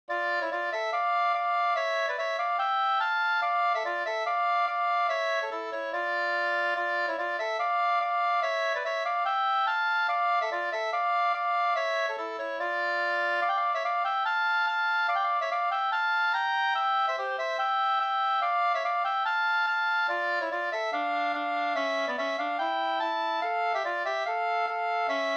schottis; e Johan Dahl Sm Nr1 2st

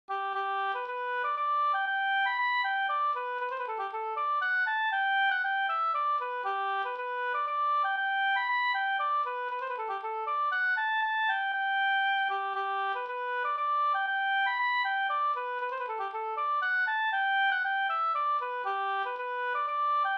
polska; Polska efter Wilhelm Eriksson, Leksand, Dalarna

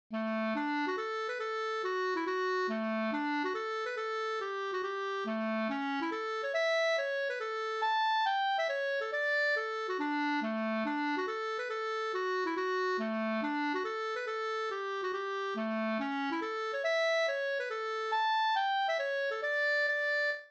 hambopolska

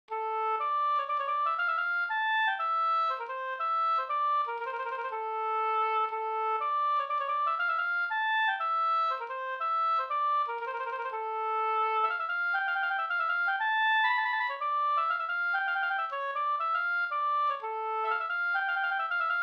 polska Bodapolska

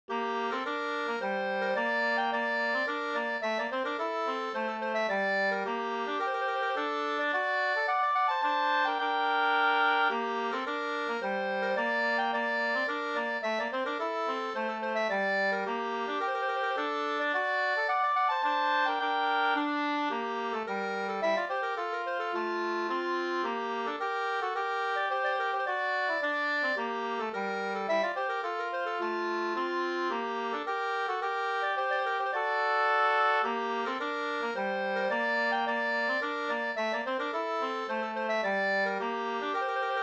polska; Vastanmadspolskan e Byss-Kalle

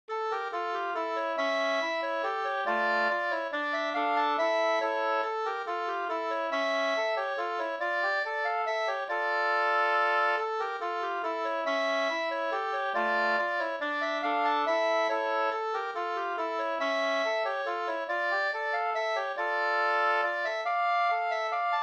vals, D moll

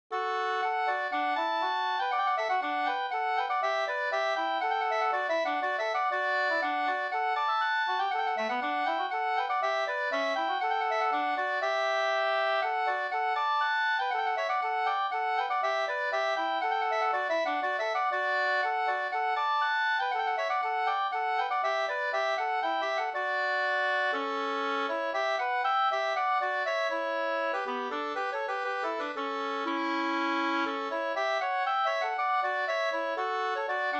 polska Dalbopolska

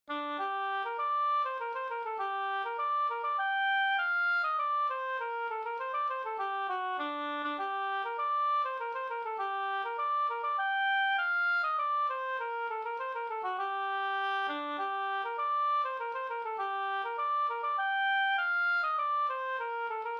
polska Systerpolska; Ore; Pal Eriks polska

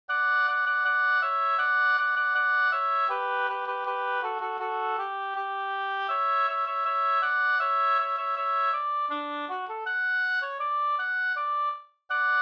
ganglat

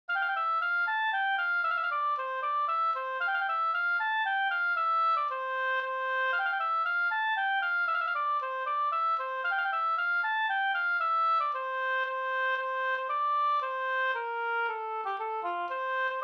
slängpolska

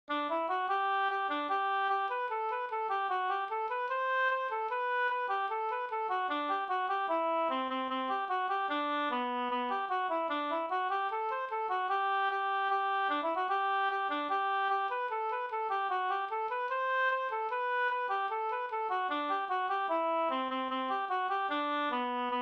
schottis 4-4

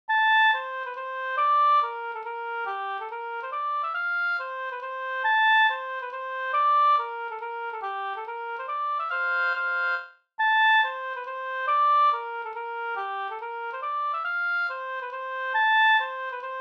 mazurka; Martis-Johans mazurka Nb

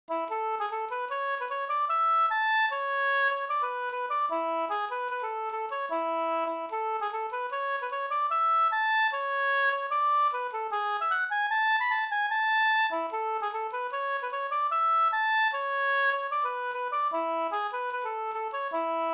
reinlender; e Peder Nyhus no

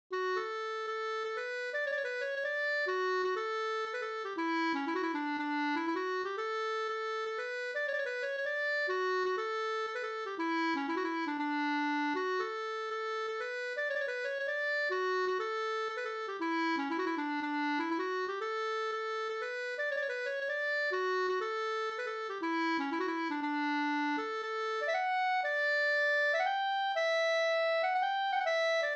polska Bodapolska

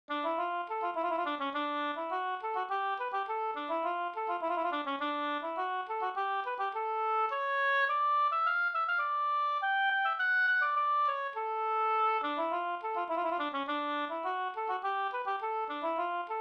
polska Bingsjopolska

